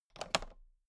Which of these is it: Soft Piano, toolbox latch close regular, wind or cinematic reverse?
toolbox latch close regular